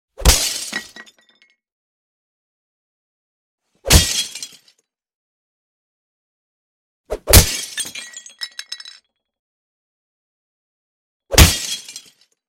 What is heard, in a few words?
Punching Glass; Hollywood Edge Martial Arts and Human Impacts